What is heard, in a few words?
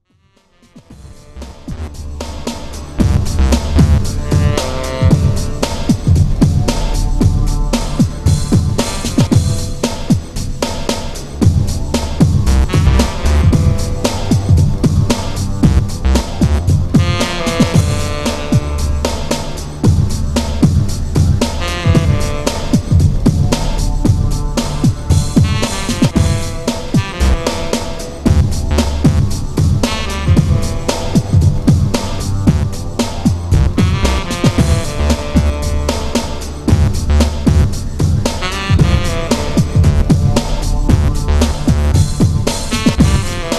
sax session